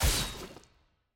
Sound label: aah; shaco